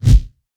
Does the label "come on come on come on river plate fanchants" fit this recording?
No